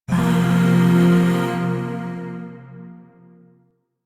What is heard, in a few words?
Shawzin Pent Min Chord A; Music; Chords; Warframe; Zariman Void Shawzin; DE